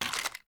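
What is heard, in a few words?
weapon foley assault rifle xmag reload empty fast mag out; gmod; viper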